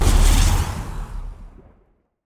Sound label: Magic ranged Attack; Deamond